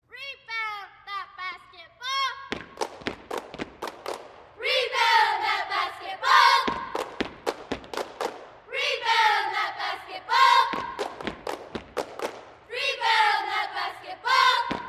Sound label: Cheerleaders High School; Cheer 6; Rebound That Basketball, Exterior Perspective